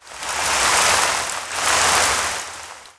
rain; Mafie 1

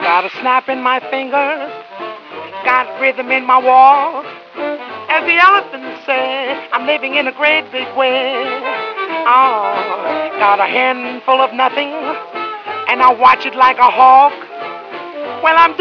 music; Mafie 1

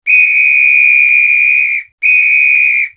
fife; Mafie 1

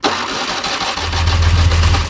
chev on; Mafie 1

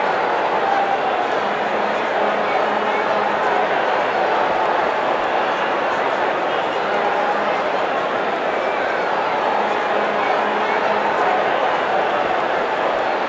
public; Mafie 1

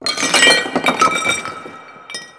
bottle; Mafie 1

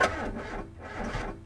start bad; Mafie 1

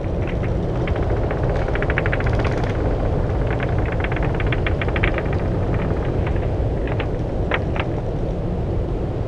room; Mafie 1

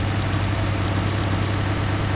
loop motor; Mafie 1

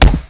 body; Mafie 1

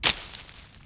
bush; Mafie 1

